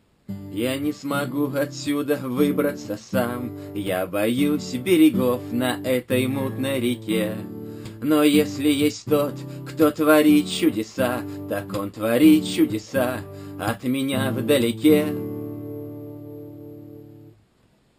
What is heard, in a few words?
guitar; Hero2